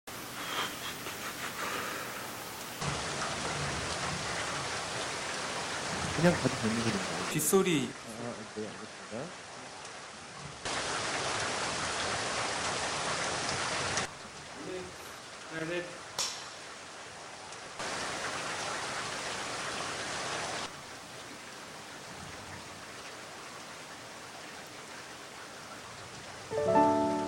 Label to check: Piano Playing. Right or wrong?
Wrong